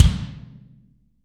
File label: BD, Real Mega Drums